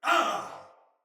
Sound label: Death, Soldier Male; Battle; Sinecure; en